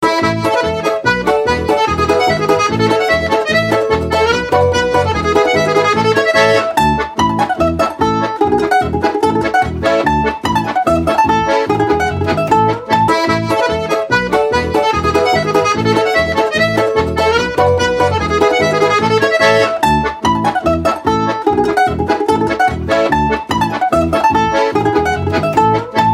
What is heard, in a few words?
Tiroler Polka